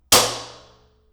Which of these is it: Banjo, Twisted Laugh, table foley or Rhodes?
table foley